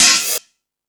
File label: Dance, Drum Machines